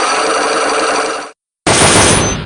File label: lock start; psychelock